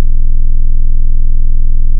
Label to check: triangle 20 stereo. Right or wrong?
Right